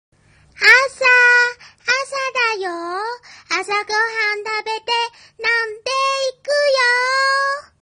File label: ring